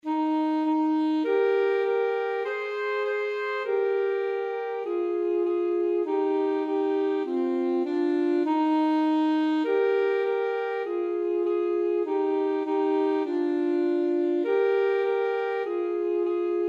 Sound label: twinkle twinkle, alto saxophone duet